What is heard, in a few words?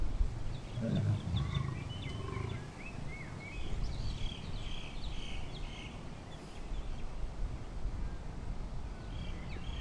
Lions At Melbourne Zoo